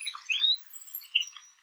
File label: Chirp constant